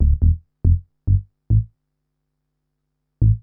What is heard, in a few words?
SNTH BASS TEKNO 140 A